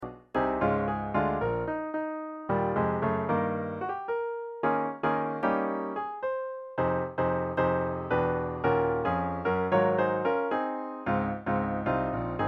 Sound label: Piano